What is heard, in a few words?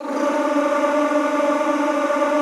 D3, Extended Classical Choir; Best Service